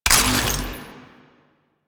Ammo Pickup Magnetic; Ammo Ability; DE; Warframe; Frumentarius

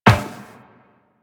Run Metal Perforated, Footsteps; Warframe; Tenno

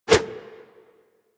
Generic Flip Whoosh, Foley; Characters; Warframe

